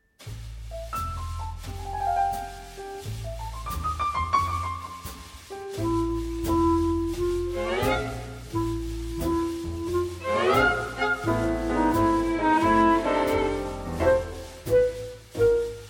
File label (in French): Clarinette; Accompagnement avec mélodie